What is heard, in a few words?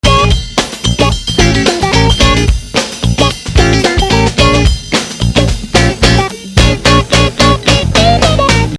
Music loop